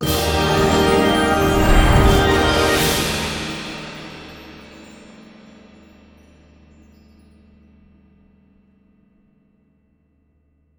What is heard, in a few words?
freespin End; netent